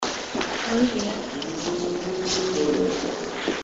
Humming, This care-free humming was picked up during a tour of the hospital.; Alcatraz